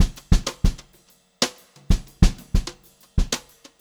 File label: Brushed Drum Loops jazzy 126; USB Soundscan vol.39; AKAI; 90 s Sample CDs